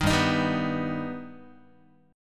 G Augmented, Guitar; G+ strummed; 6,3,6,5,3,x; 4A,4E,5A,5D,5G#,6C#

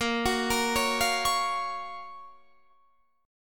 F sharp Major 7th

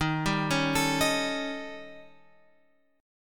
D sharp 7th Flat 5th; D#7b5 Chord {11 10 11 X 10 11} arpeggiated

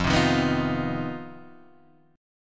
C Minor Major 7th Double Flat 5th F, chord

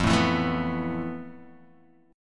F Major Sharp 11th, Guitar Open G; 3,2,2,2,0,x; down; chord shape

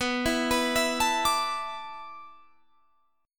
D 6th Suspended 2nd B, chord; D6sus2/B arpeggiated

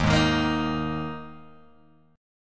Eb Suspended 4th Eb Guitar Open G

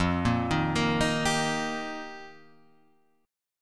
F 9th Suspended 4th F, chord; arpeggiated; JGuitar

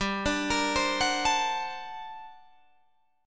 G Minor Major 11th, G, Guitar, Drop D

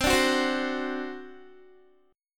C Suspended 2nd Flat 5th C down Guitar; Csus2b5; strummed

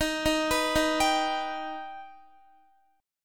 D sharp Augmented D sharp Guitar; D#+ arpeggiated

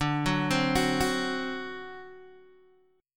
D 7th, Guitar, Open D

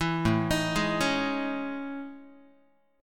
A Major Sharp 11th Guitar; AM#11 arpeggiated; 7,5,6,6,5,x; 4A,4E,5A,5D,5G#,6C#

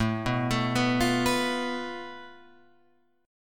A Suspended 2nd Sharp 5th, Guitar, Open F; arpeggiated; JGuitar

